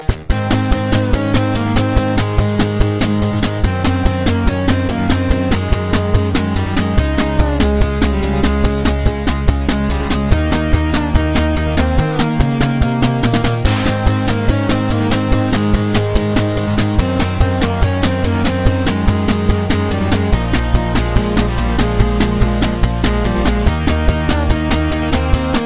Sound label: 8bit